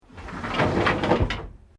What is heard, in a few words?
Door Sliding Interior Wooden Room Close